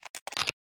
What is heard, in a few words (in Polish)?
wkladanie kosci do piszczalek